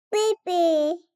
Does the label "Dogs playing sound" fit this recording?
No